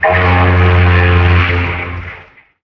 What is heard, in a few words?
ship horn